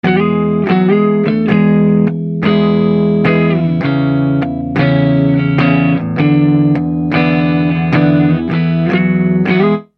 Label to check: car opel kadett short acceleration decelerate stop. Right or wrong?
Wrong